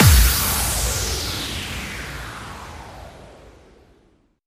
Bassdrums FX, FX Kicks; Vengeance Samples

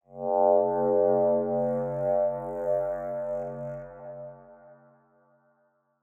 Pad; Dubstep Sample Pack

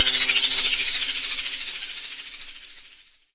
FX Downlifter

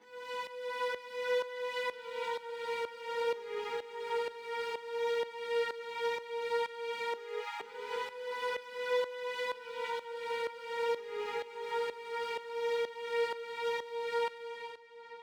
126BPM Melody; Vengeance Samples; Netherworld Kit

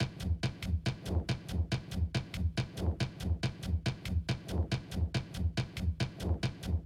140BPM Genetic Kit

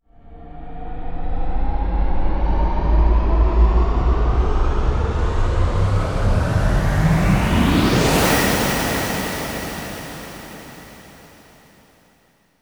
Sweeps Long

